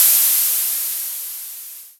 crash single shot g key, Royality free crash cymbal one shot tuned to the G note